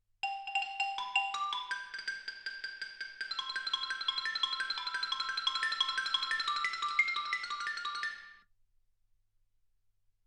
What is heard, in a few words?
xylophone